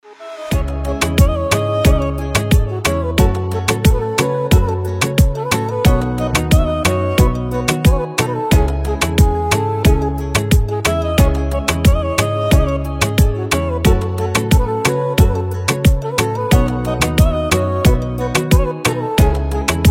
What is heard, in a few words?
instrumental